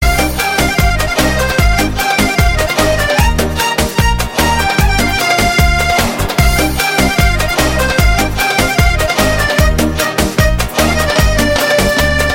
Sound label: Music